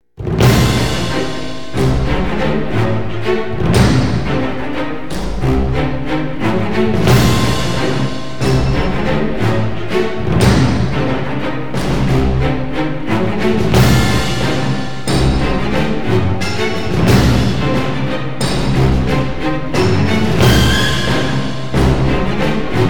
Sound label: Without Choir